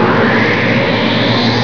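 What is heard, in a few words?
JET THRUST; TRANSPOR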